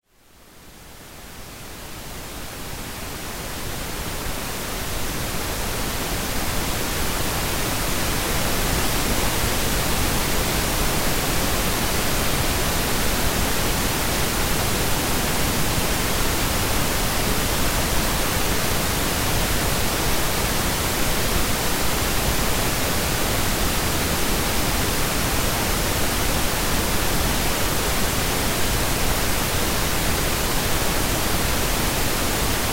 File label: pink noise rumore rosa